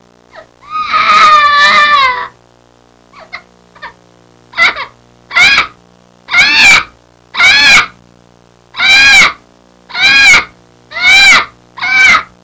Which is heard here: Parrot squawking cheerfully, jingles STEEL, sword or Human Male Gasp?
Parrot squawking cheerfully